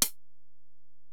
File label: HiHat, Alesis DM-PRO